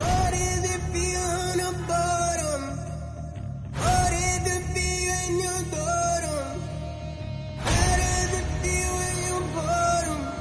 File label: cinematic action drums background; tamil